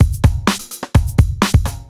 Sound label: Drum Loops; Fruity Loops 4.1; FLStudio; Patches